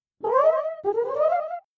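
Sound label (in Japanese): スライム 強攻撃, モンスターボイス; voice monster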